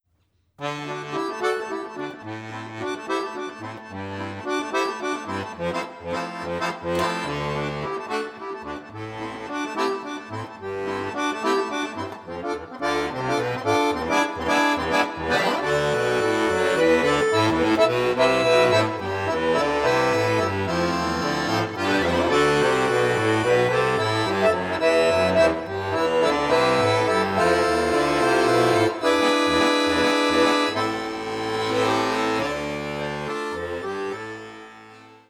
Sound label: accordion